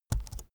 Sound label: keyboard laptop enter press slow soft; kommunikation; computer